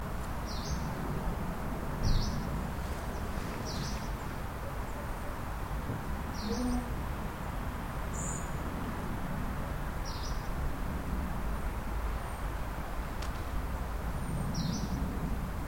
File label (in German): gelbbrauen-laubsaenger